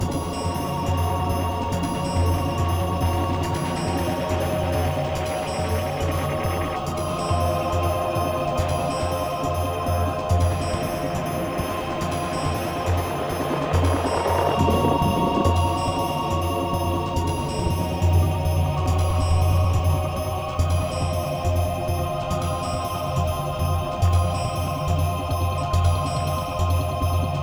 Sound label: Glitch sonata Slow; rive wreck hack die retry; ps4